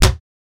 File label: Cabinet Door Slam, Close, Interior